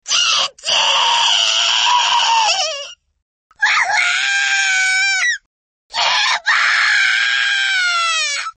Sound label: screams; klonoa